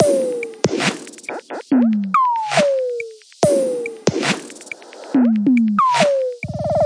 140 minimal twerky twiddlebop, drum loops and breaks